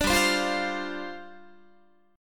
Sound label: G 7th Suspended 4th G down Guitar